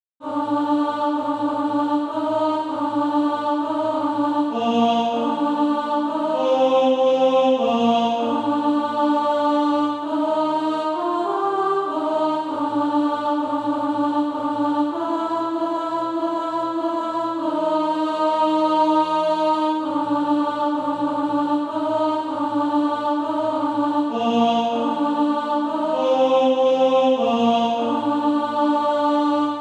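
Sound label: alto, SATB Practise Music; Dwelling in Beulah Land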